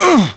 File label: Ranger Fall